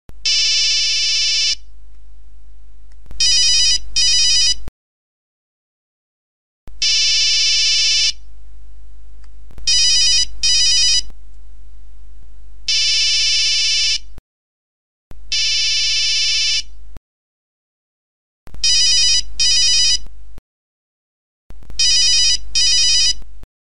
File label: Old Office Phone; Electronica